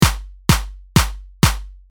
kick2 FX

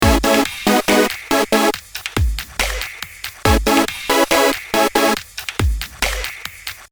unison

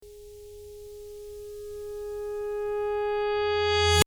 rev guitar

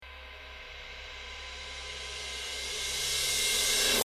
rev crash

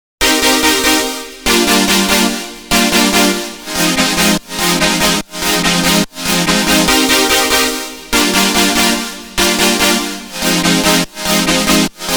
synth

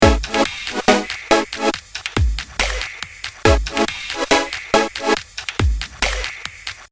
LFO

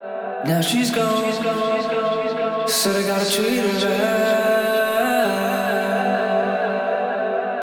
vocal after

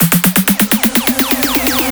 buildup after

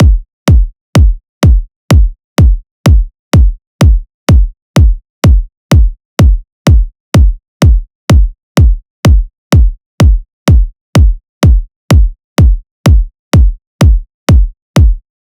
kick before

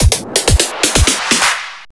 fill out after

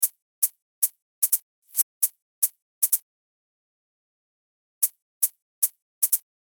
hihat

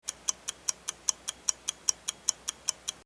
clock

